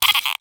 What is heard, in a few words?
Cartoon Dolphin Squeak Toy